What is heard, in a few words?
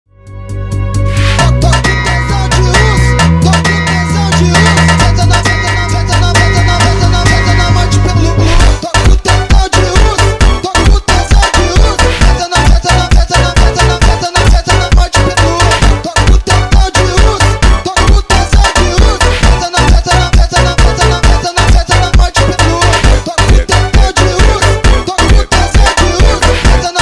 funk